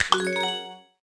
attach

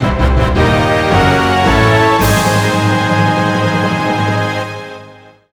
Mystery of History Discovered! [Jingle]; Drihoo

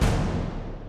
Tymp

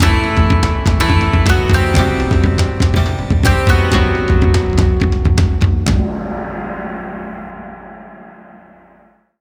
Match Lose; Piston Hondo

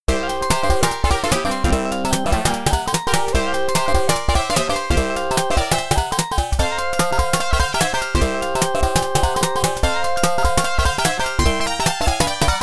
BGM (Faster); Block Block; arcade

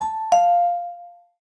pinpon

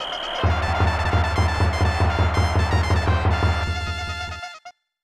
Scoring Hit

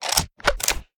Salvo Reload

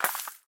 Snowball Take; miside demo windows gamerip 2023

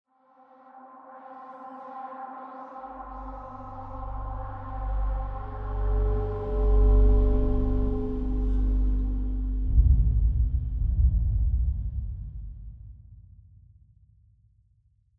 Dark Scary Explore Mood

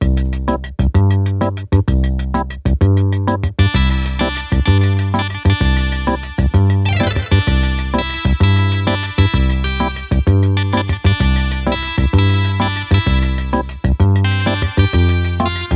CASIO Rock; Wunderling

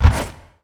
shadow dodge puff; hero mirage

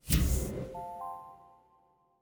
Doors Up; PCBS; Aorus

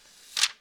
Diary Attachment Open

